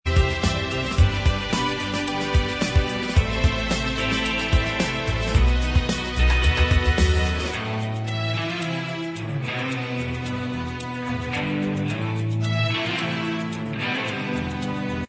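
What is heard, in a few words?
instrumental, backing track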